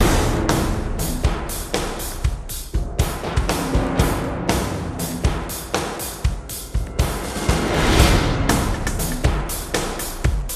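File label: drum loop swells; transformers war for cybertron gamerip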